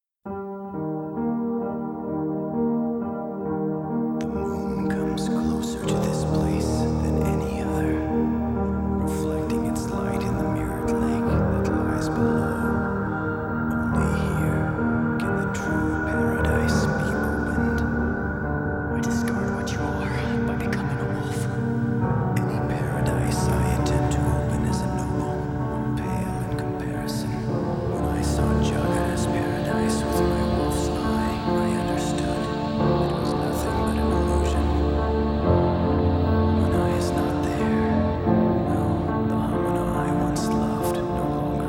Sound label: Organ Ver.; Moonlight Crucible; Wolf's Rain OST 3